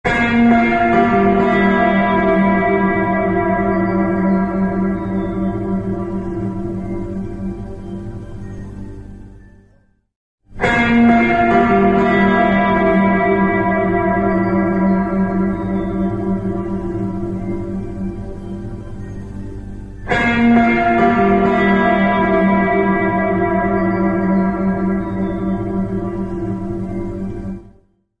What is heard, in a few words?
Old phone bell